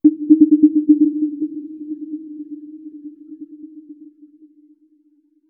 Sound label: Forest Amb; moul